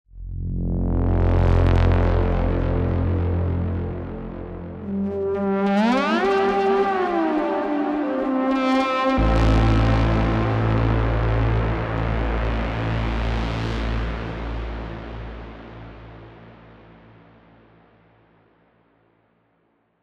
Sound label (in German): Tunneleffekt, techno pads; revealed diva techno pads vol 2